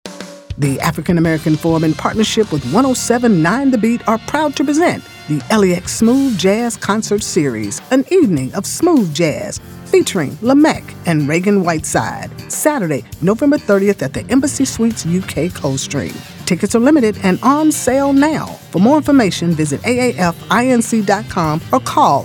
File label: Smooth Jazz